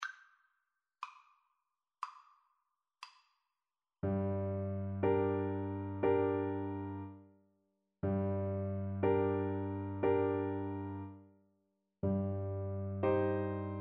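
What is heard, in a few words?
recorder karaoke; czardasz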